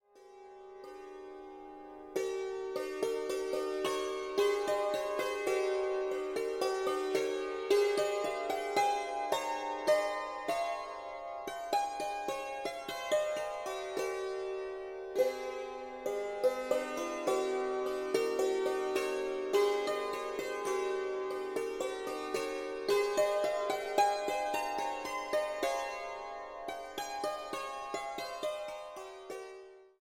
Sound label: Minuet